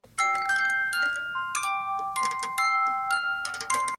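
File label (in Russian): shkatulka otkryvaetsya shchelchok i muzyka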